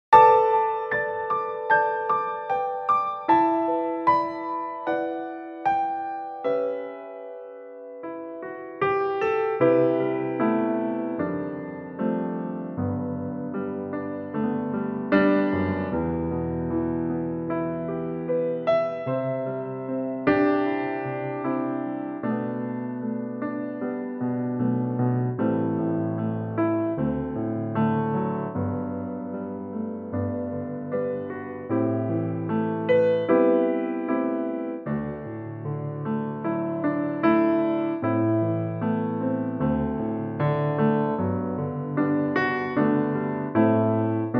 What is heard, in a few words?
piano G; key - G - vocal range - D to E